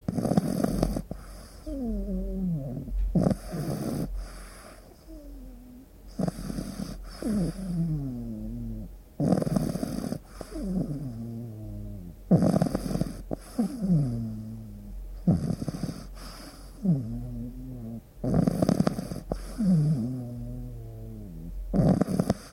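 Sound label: deep sleep with a heavy exhale, pets; domashnie zhivotnye; glubokiy son s tyajlyim vyidohom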